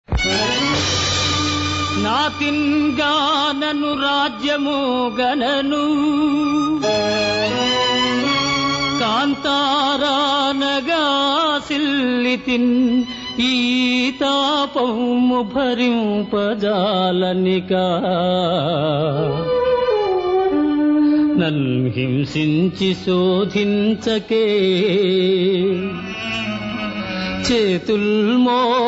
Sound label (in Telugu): Padyam; KanakaDurgaPujaMahima